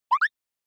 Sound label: Anime Motion Short Mid; アニメモーション; 短 中